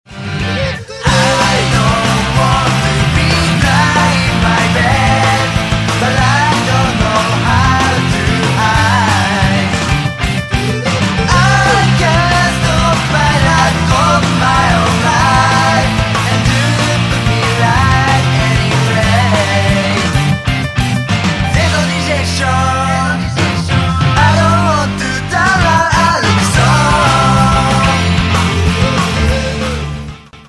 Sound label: music